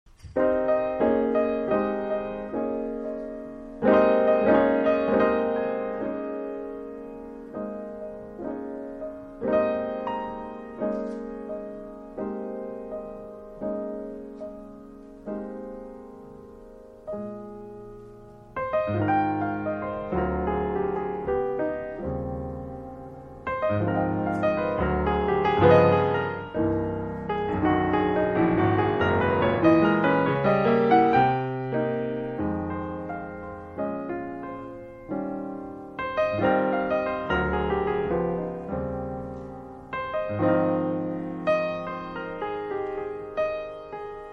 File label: Piano Jazz; Kennedy Center Washinton DC 2007